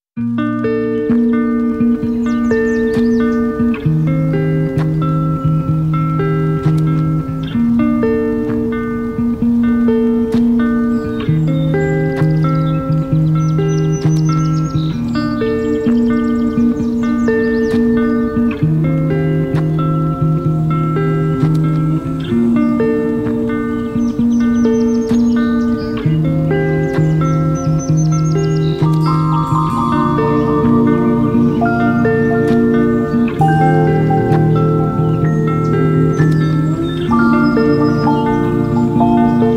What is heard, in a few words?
background music